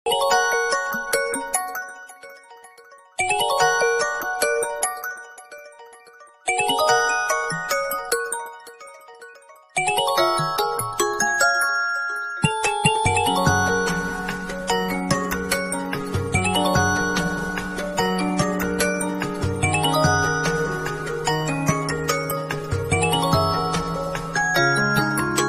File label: Wiko rington; Wiko dazzle